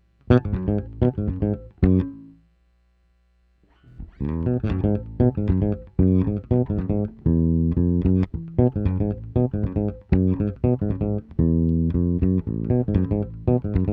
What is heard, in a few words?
bass takes